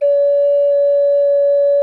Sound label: GEMSHORN ALT D; SoundBytes; Early Patches